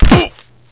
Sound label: land; dusk